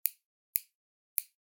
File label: scissors